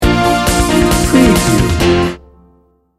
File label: happy sunshine sting bumper